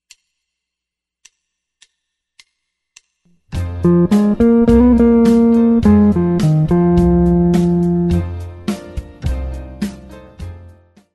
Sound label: guitar